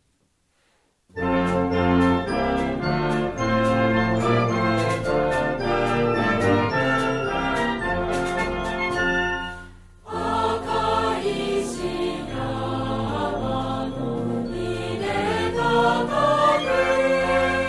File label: brass band